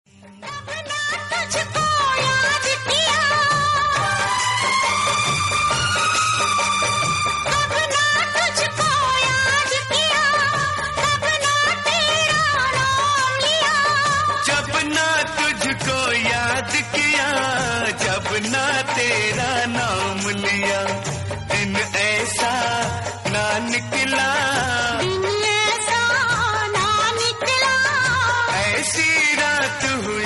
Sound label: old hindi